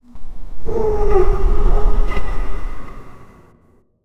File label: a chilling soundscape of, zombie